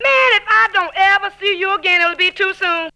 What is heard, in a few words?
VOX; Zero G; Man Machine